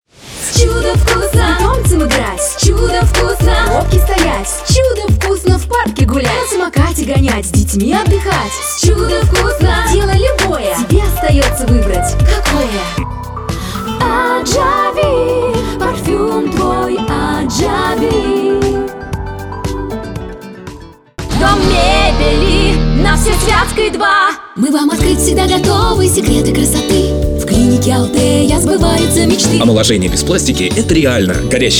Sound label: vokal; dictor